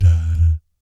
BS DADAT, Vocal Jazz FX; Spectrasonics; Vocal Planet; JAZZCOMBO

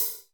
Cym Rock Edge, Alesis D4; Drum Machines

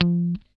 GUI F3, E-Gui Muted; Sound & Vision; Gigapack I CD 2

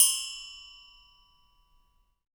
Perc Toys

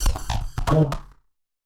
Casio, Glitched, malfunctioning synth

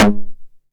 909 SD Tape Sat

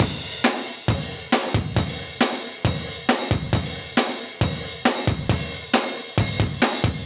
136 live breaks

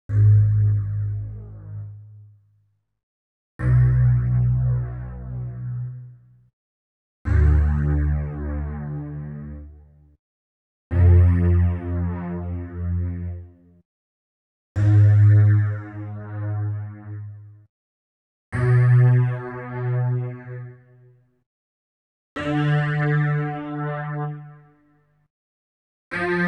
Super Fast Strings G#+3; Klaus Schulze Collection

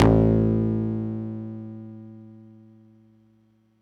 PAFMBass a2, Yamaha YX81Z

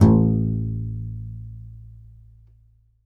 C. Bass Balalaika, Plucked Strings; E-MU Producer Series Vol. 7 – Old World Instruments

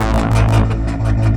seq, drum n bass keys pads; Sonic Foundry (Sony Creative Software); Knutrix Drum & Bass; Keys Pads 2

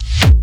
BD, Dance Mega Drums; Best Service

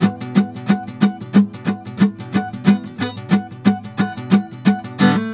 GUITAR, Balkanish Traditions

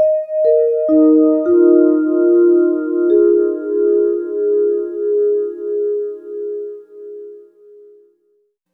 Vibe, Vibes; Sonic Foundry (Sony Creative Software); James Johnson Spektral Minimalism; Sublime Keys

